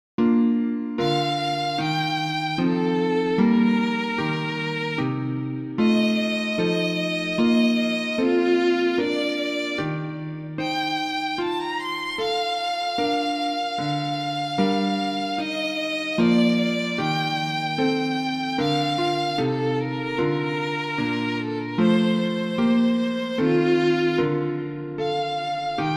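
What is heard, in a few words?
Handel trio sonata for two violins and bass; g. f.gendel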